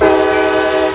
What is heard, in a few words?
diesel; fldigi; alert clips